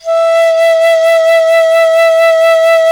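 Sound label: flute piccolo E; Miroslav Vitous - Solo Instruments VOL-2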